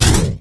zap; sfx; Universo Animado

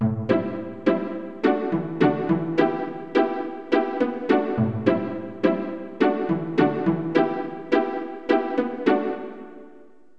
pizz

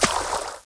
water; Thief

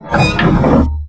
Switch; Forsaken

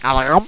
swallow; Geschicklichkeit; Mean Cuisine

